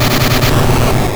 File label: Selfdestruct Hit; Pokemon; Gen 2; attack moves; GSC